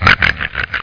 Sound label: oink